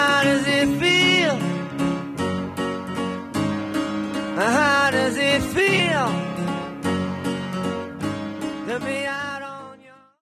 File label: Waltz